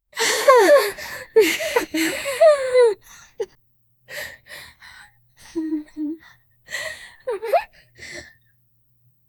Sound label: realistic sound of a 15 y, distress